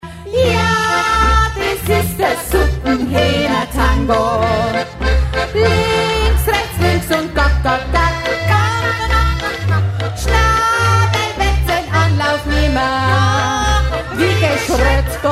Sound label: Tango Chorus